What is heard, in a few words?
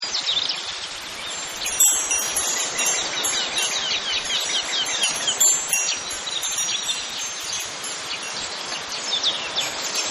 Birds Chimes